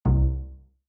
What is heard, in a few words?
unsuccess